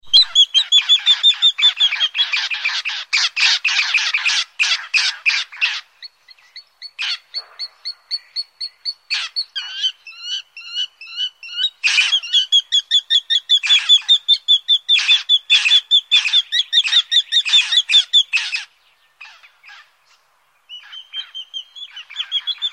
sparrowhawk collared, bird calls

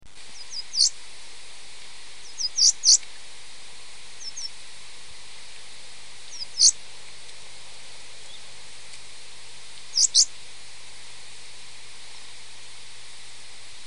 whiteface southern, bird calls

